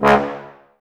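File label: Brass trombones C#; Miroslav Vitous - Woodwind Brass Ensemble; Tbs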